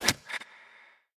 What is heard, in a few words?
Bow Hit